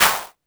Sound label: polyvox clap